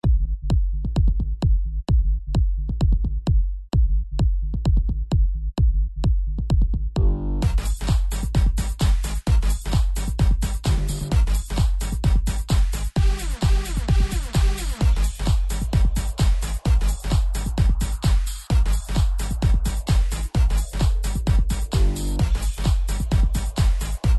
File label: percussion; virtual dj